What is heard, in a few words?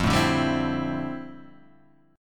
D Minor 11th F